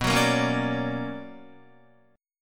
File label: B Minor Major 9th, Guitar; BmM9 strummed; JGuitar